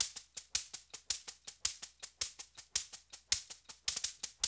World Dance; OZ!

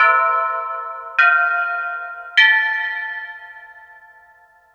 Pelog Unison Tube; Melodic; Ambient-Melodics-II

